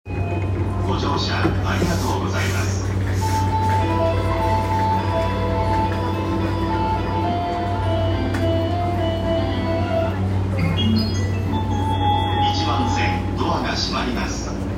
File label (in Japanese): JR 山手線 駒込 CW, train sounds